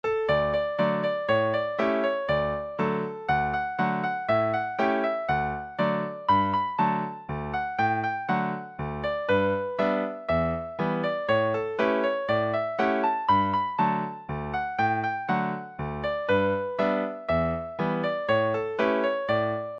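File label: seven-step polka, scottish guitar; mandolin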